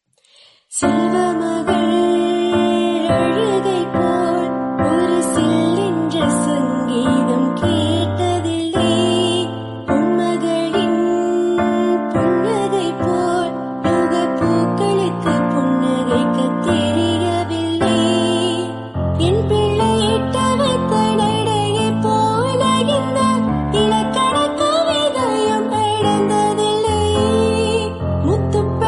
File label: Female Version